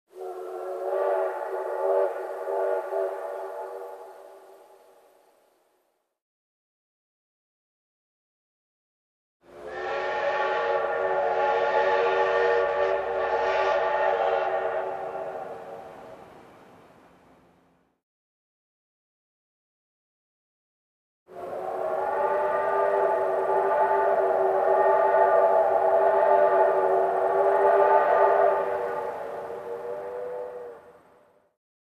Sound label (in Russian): poezda